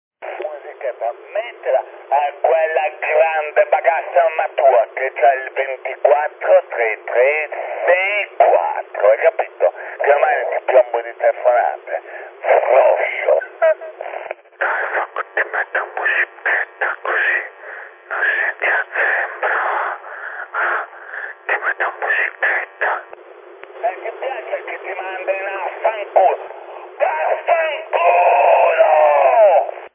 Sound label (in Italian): musichetta